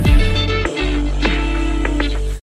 Riff

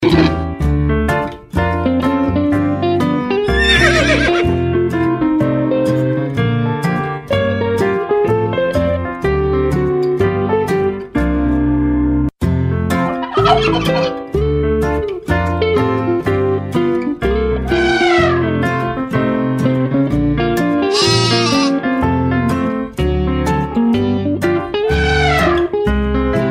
xmas bells; ringtones

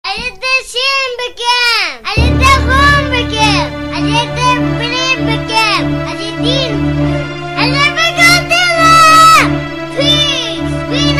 Kurdish hip hop

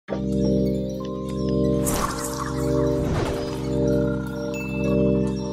Relax Notification

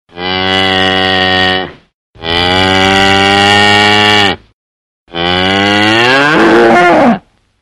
funny cow; animals

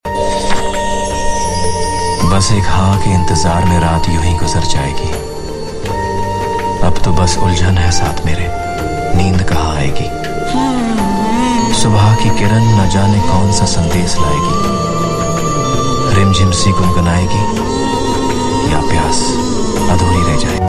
tone of love

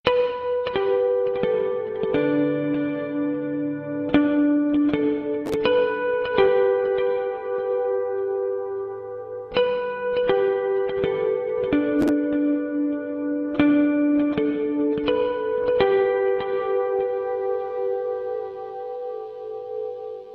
guitar effects

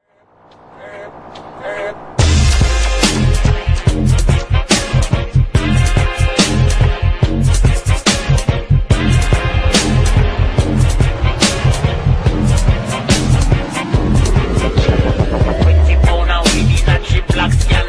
dubstep remix

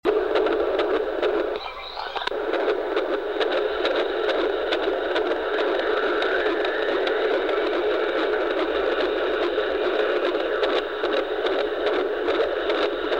heartbeat; children

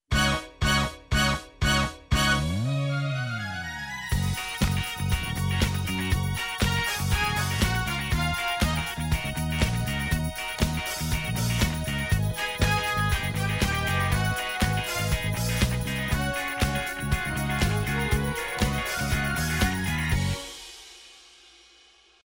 instrumental

